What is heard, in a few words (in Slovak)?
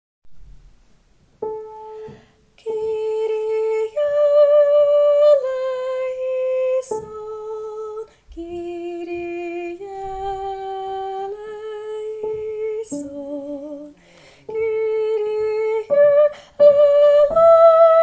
sopran; Kyrie; sopran ll; spevokol